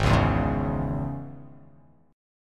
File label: Ab Suspended 4th Sharp 5th, Absus4#5 chord; strummed; JGuitar